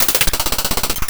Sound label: Rhythm